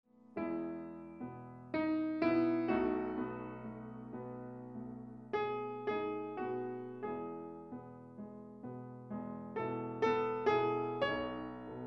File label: Realistic Piano Chopin etude